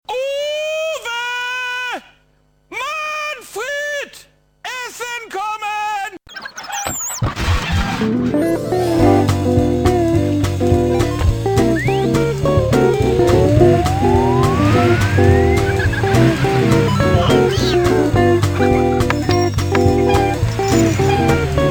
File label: Ringtone